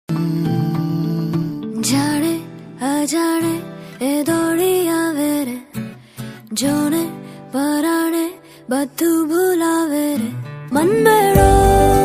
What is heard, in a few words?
gujarati ringtone